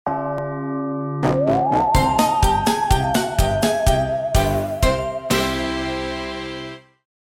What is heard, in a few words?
Halloween playful transition cartoon; festivities